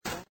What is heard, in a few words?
light rain with crow